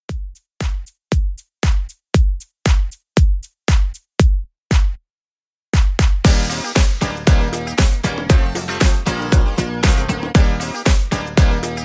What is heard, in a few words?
90s Classic 117 Bpm